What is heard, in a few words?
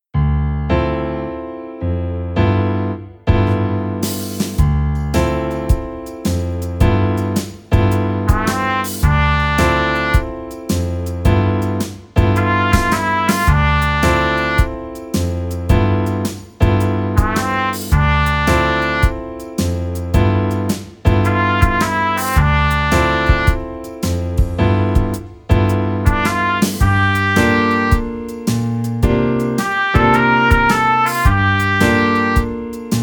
Trumpet SWING; easy beat vol 1 trompete